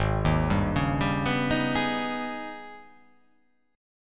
G sharp Minor Major 11th

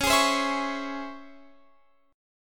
Db Minor Major 7th Db down Guitar Open F; strummed

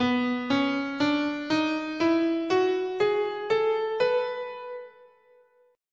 B Bebop Minor, scale

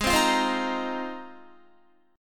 Ab 6th Add 9th, Guitar; strummed

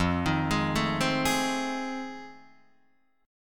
F 7th Sharp 9th, Guitar, Open D

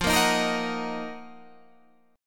Eb Minor F; Ebm/F strummed

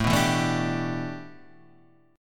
A 7th Suspended 2nd Suspended 4th; A7sus2sus4 strummed

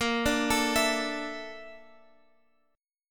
Bb Major 7th Flat 5th Bb down Guitar Open F; arpeggiated; BbM7b5

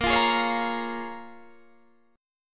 Bb Minor 7th; Bbm7 strummed

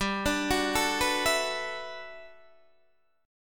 G Major 13th, Guitar DADGAD

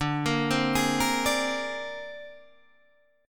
C 7th Suspended 2nd Sharp 5th D

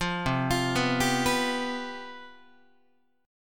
C Major 7th Suspended 4th, C, down, Guitar; CM7sus4 arpeggiated

